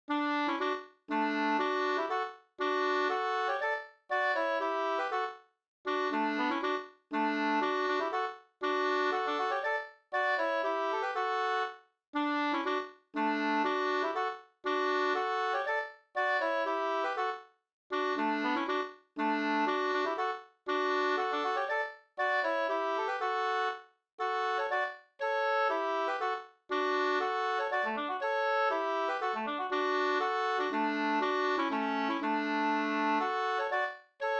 polska

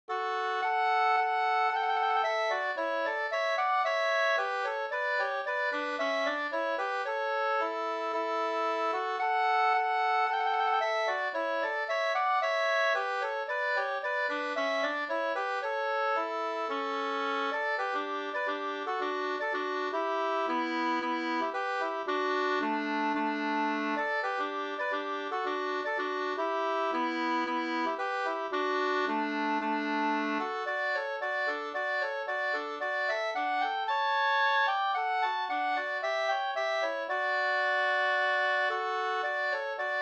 skanklat

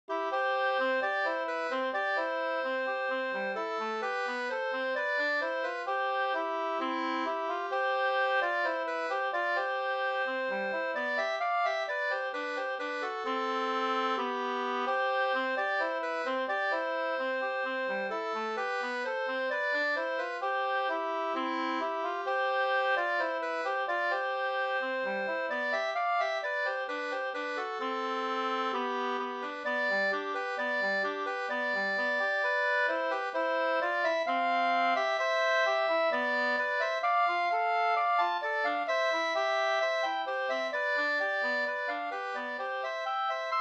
skanklat

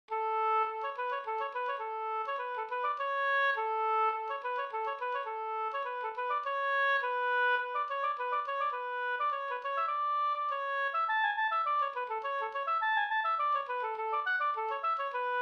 polska Brannvinspolskan

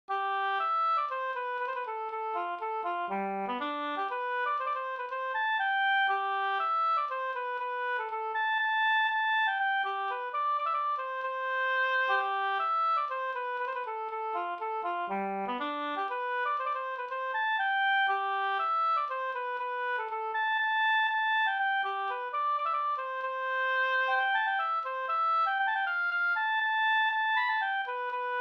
polska Ramnaspolska

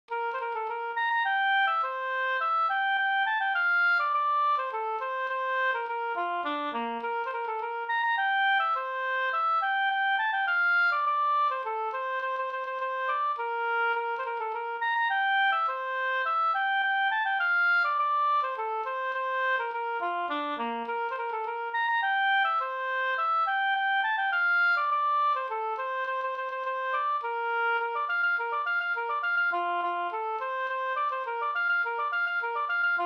slängpolska

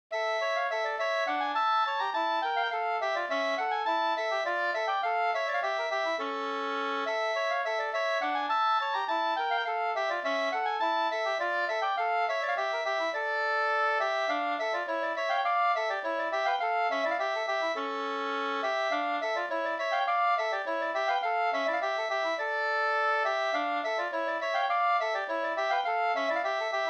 halling; e Spak Erik Sarna 2st

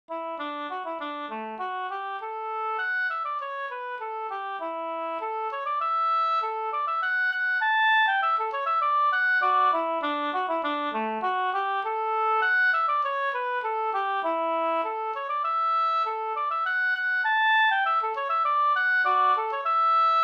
kadrilj; e Pehr Johansson Ekelund Vitaby SvL

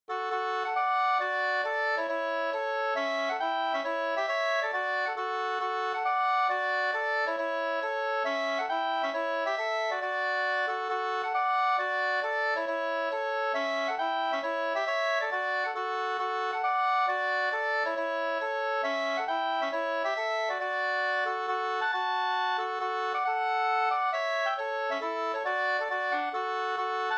hambopolska, 2st

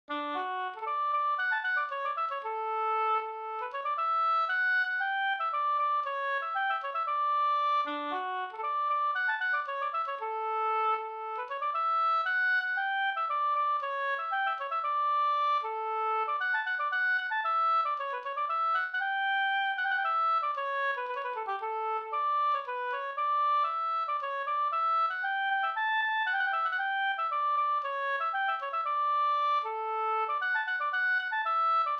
polska Bingsjopolska storpolska; Pekkos Pers storpolska e Pekkos Helmer

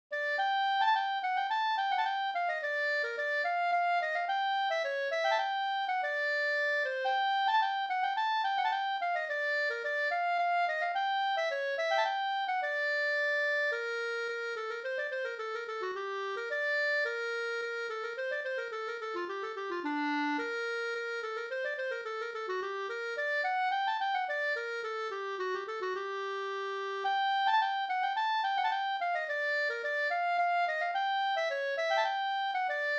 polska

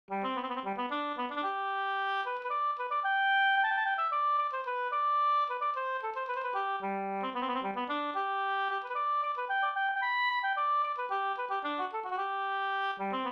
polska Bingsjopolska; Kalla karar e Pekkos Helmer

